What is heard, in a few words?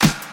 dry snare sound D# key, Royality free snare drum tuned to the D# note.; snare drum samples; one shots